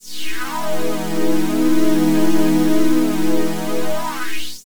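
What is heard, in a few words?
Pad Synth; Equipped Music; Loungin House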